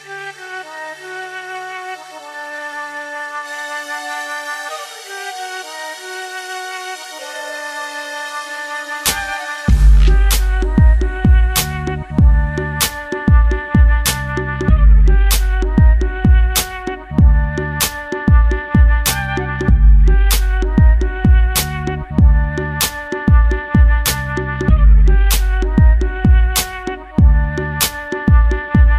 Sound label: bright beat